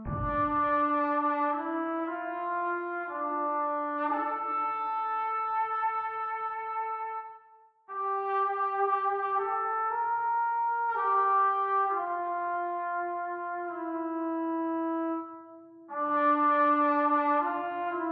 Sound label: Cemetery Trumpet